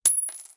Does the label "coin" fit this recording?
Yes